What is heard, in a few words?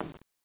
RAIL HIT; POOL CHAMP